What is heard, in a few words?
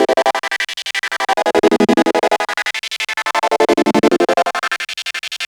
Chord Am, 175 BPM; loops; CHIPSHOP DELUXE